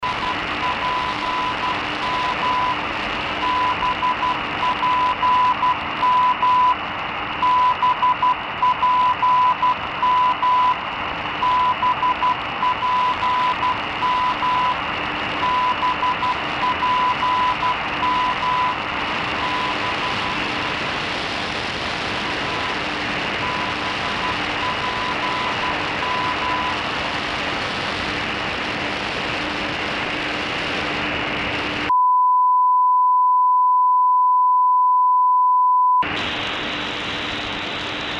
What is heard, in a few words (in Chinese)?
BPM, 接收中科院授时中心电台信号; 设备：SDR